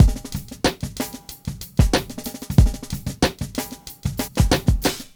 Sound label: Drums, Hip Hop; Sonic Foundry (Sony Creative Software); Lukecage Underground Soundlab Hip-Hop